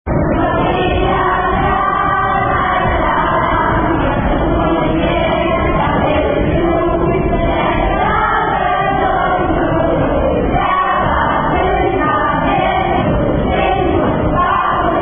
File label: Father Abraham [Father Abraham In Romanian In A Church]